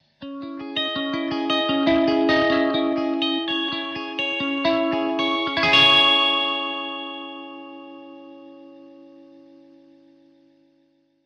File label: Elect Guitar Sharp, electric guitar